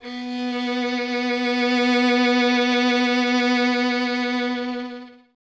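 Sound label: string violin, Solo Instruments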